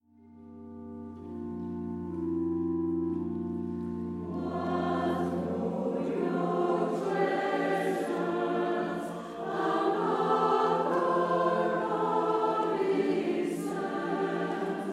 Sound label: christmas lullaby